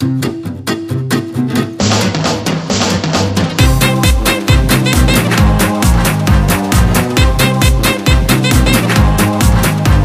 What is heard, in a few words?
swing party